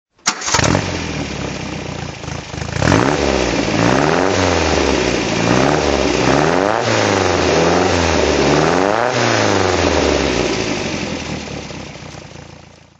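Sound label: mg mga 1600mk2 throttle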